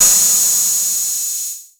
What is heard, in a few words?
Roland Tr 606 Crash